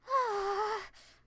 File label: Hhhhhhh; Momiji